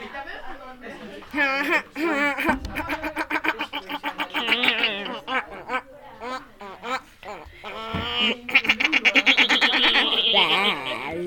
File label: hahahahahaahahaha